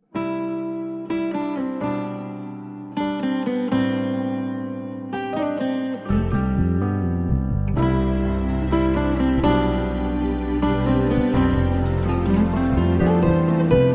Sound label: Romantic Guitar